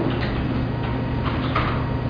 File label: mech; RenderOrgan